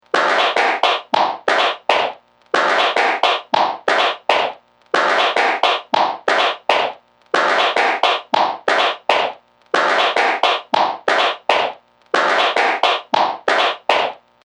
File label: Snap Crackle Pop Machine Loop, machinery; Looping Sound Effect